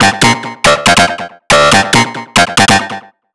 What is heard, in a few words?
ELECTRO SYNTH 140 A